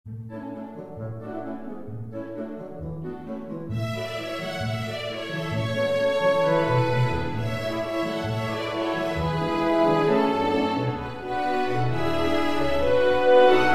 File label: chamber orchestra